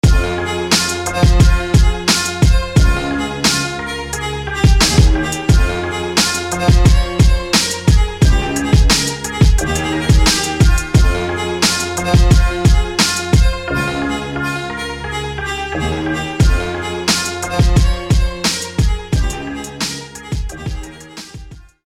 Clavi; Clavi Storm

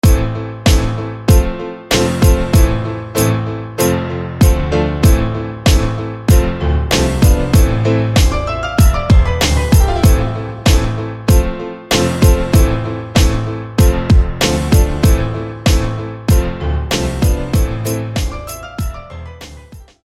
Piano Exquizite; SFB FX

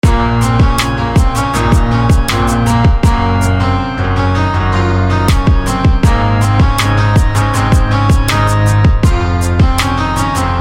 Guitar; Axis; SFB FX

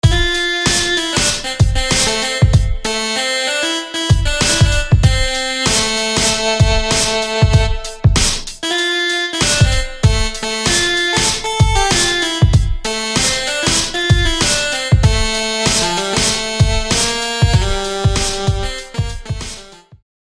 Saxy Stroke; SFB FX

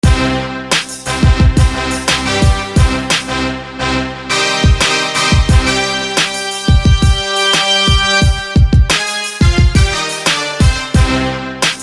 Glimmer Brass

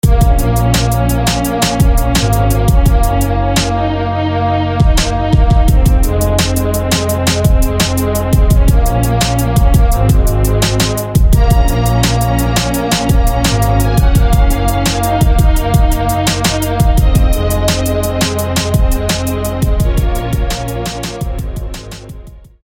Strings; Verdict; SFB FX

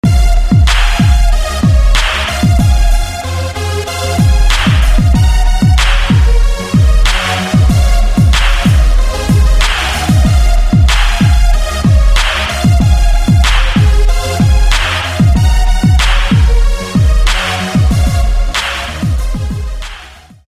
Echoed Lead

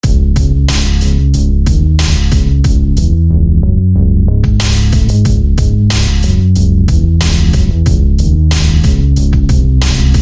Bass; Westhood; SFB FX

